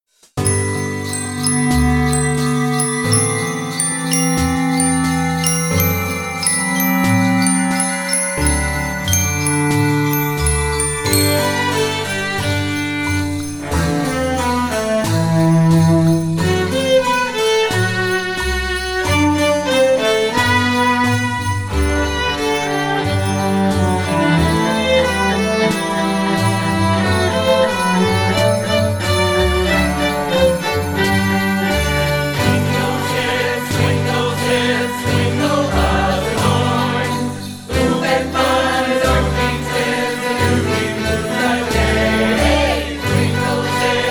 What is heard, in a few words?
Jingle; Sims